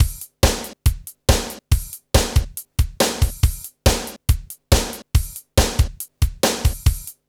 rock; Funky Xtreams; INIS

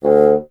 WND BSND, bassoon; Solo Instruments; Miroslav Vitous

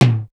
Tom, Drum One Shots; Coldcut's Kleptomania VOL1

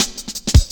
Drum Loops, Jungle

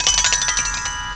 BELTREE, BELLS; CMI Sound Library Rev 1.3

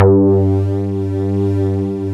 NEW WORLD B, Bass Synthetics; E-MU Producer Series Vol. 2 – More Studio Essentials

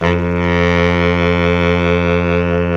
SAX B. SAX; SAXOPHONES; Roland - LA Composer vol. 1

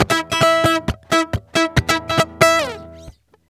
merengue, World Grooves; Best Service ProSamples vol.14; AKAI

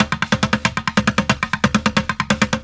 Street Groove, Ambient Grooves

